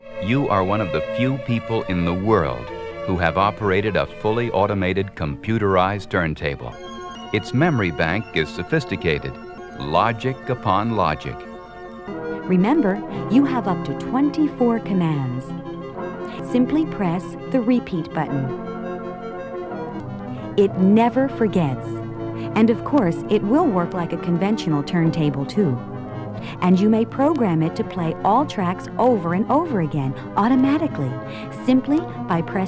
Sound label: Computerized Turntable; Dimensional Holofonic Sound; Cybertournetablimse